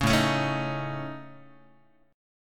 A Major Flat 5th, Guitar, AMb5 chord; strummed; JGuitar

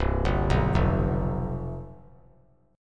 C Major 7th Suspended 4th, down; arpeggiated

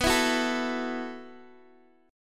B 7th Suspended 4th B down Guitar Open D

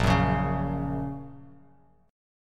A sharp Minor Sharp 5th, down, Bass Standard; strummed; JGuitar

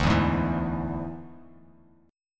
D Suspended 2nd Flat 5th D down Bass Standard; strummed

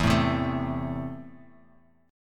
E Suspended 4th Sharp 5th, Bass Standard; strummed; JGuitar

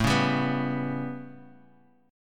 A 9th A; 2,1,3,2

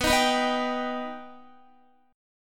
B Augmented B down Guitar; strummed; JGuitar

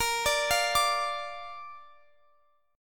Bb Major Bb down Guitar Open F; arpeggiated; JGuitar

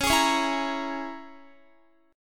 C sharp Minor 6th C sharp, Guitar; C#m6 strummed

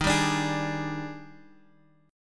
D sharp Major 11th D sharp, chord; 8,7,10,11; 4G,5C,5E,5A; chordshape

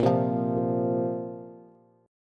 Bb Suspended 2nd Bb down Bass; strummed

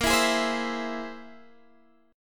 C Augmented 7th A sharp

